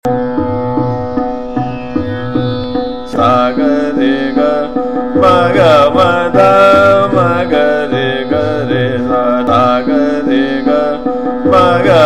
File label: Sargam, Sa Ga Re Ga Ma Ga, Trital; Todi